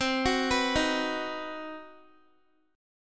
C Minor Major 7th Flat 5th chord, Guitar; CmM7b5; arpeggiated

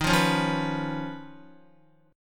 Eb 7th Suspended 2nd Sharp 5th Eb, Guitar; Eb7sus2#5 strummed; JGuitar

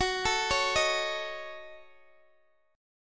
F sharp Minor 6th F sharp Guitar DADGAD

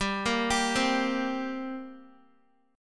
G-Minor Double Flat 5th G down Guitar; arpeggiated; JGuitar

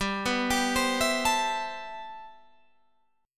C Major 13th G

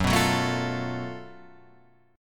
F sharp Minor Major 7th Double Flat 5th

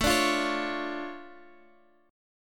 D Minor Major 7th, A, guitar

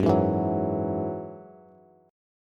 Gb 7th Suspended 2nd Gb Bass, Gb7sus2 Chord; strummed; JGuitar

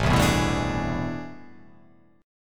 A Minor Major 11th, down

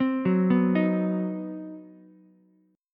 C Minor Major 7th Flat 5th chord, Ukulele; arpeggiated; JGuitar

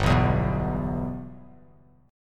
G 6th; G6 strummed

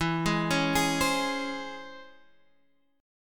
C sharp Minor Major 7th, chord

